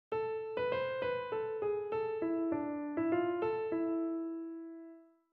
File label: Continue the melody minor key example